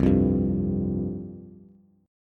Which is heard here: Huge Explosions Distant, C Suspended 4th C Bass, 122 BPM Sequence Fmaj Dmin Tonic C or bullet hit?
C Suspended 4th C Bass